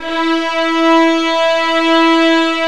VIOL E3, VIOLINS

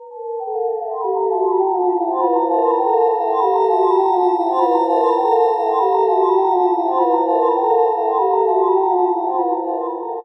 Pad; Dream Zone

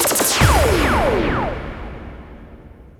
FX HIT, Techno Trance; Ueberschall

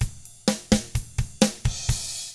surf rock, Drums; ILIO; Double Platinum Drums 1

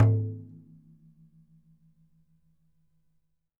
MADAL, DRUMS OF IND; AKAI S6000 CD-ROM; WorldSounds

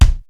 SPD-8 KIT

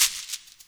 SHEKERE; InVision Interactive; Percussion Slam

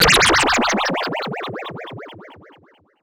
Misc Synth FX; musicradar; cartoon caper

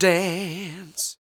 DANCE; Spectrasonics Vocal Planet

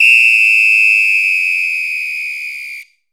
WHISTLE HI, GM PERC KIT; AKAI S-Series CD-ROM Sound Library VOL-5

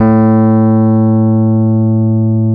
A 1, Electric Pianos; Wizoo

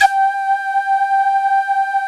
shakuhachi; Greytsounds; Scott Peers Sound Engineering

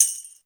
TAMBOUR K, Ragga & Dub Reggae

